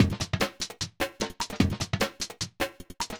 phewstep 150

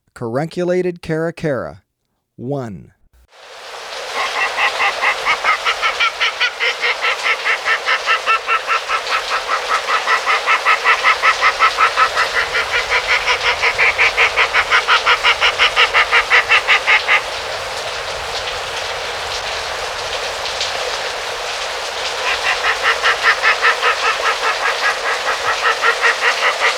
Carunculated Caracara, Birds of Ecuador; S. America

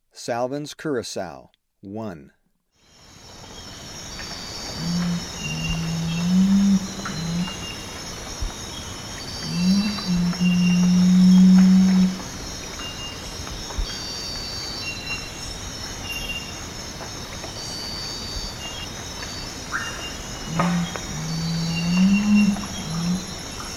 Salvin's Curassow; S. America

